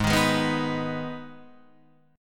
Ab Minor, chord

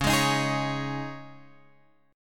Db Suspended 2nd, Guitar, Open F, Dbsus2 chord; strummed; JGuitar

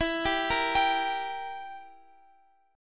E Diminished E, Guitar, Open F; arpeggiated; x,x,16,14,13,14

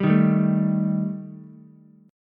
D sharp Major Flat 5th, Ukulele; strummed; D#Mb5; 0,3,3,0; JGuitar

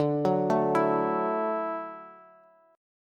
D-Major Add 9th D down Bass; arpeggiated; JGuitar

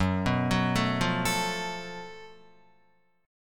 Gb Minor Major 7th Double Flat 5th Gb Guitar; arpeggiated; 2,2,4,2,5,4; 4E,4A,5D,5G,5C,6F

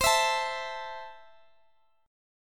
C 6th, Guitar, DADGAD

